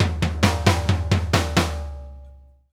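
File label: ROCK FILL, drums; Sampleheads - New York City Drumworks VOL-1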